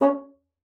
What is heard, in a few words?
C#4, bassoon; Xsample VOL-6